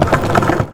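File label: skateboard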